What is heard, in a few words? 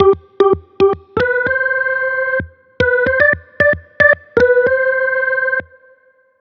Chilly Organ